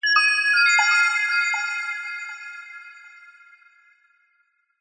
sound design twinkle mysterious warm musical; zapsplat

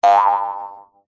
cartoon bounce jump spring single jaw harp

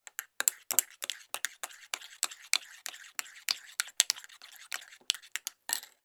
food stiring mug of powdered soup

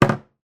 food can of soup put down on worktop

